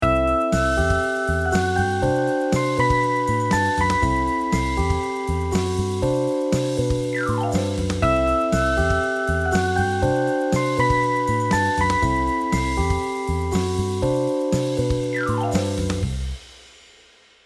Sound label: loop